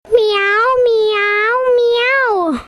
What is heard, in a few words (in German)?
Katze; harlaching